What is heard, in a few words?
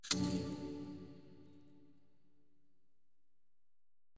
spin Button Quick Stop; fairyhansel